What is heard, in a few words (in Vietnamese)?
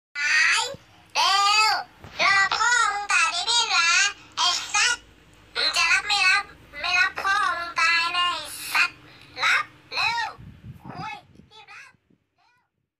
nhac chuong vui nhon